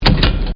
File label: impact lever; Collection Synesthésie; Isabelle Grosse